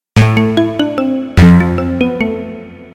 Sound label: Ethno Jam; Kawai K1 Presets; Bank 2 card A